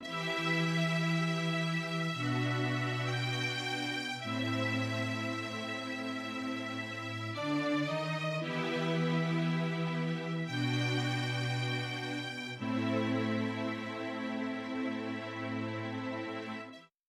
The string loop at 115 BPM; 迪斯科; 弦乐; Kontakt

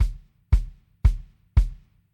Kick drums; 迪斯科; 如何制作迪斯科（disco）音乐

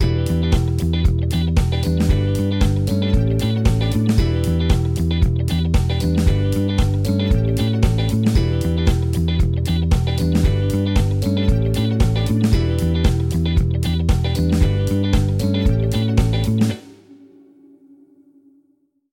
The brass stabs part with reverb; 迪斯科; 如何制作迪斯科（disco）音乐

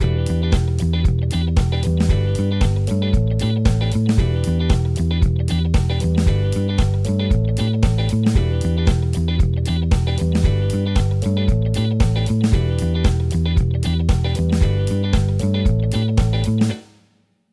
The chorus bass; 迪斯科; 如何制作迪斯科（disco）音乐

